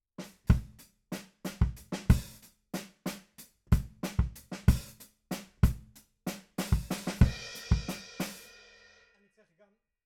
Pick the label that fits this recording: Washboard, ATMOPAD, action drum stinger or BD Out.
BD Out